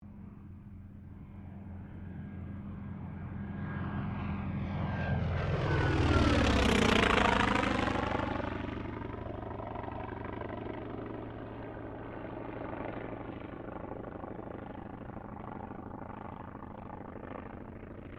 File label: AIRCRAFT T-28D TROJAN PASS